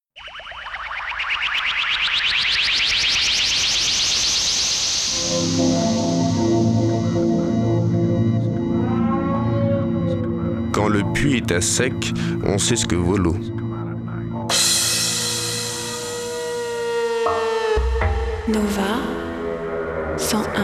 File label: Radio Nova Jingle; Nova 25 ans - 1999